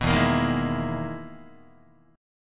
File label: E-Major Sharp 11th E, Guitar; EM#11 strummed; 2,0,1,1,0,3; JGuitar